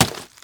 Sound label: melon impact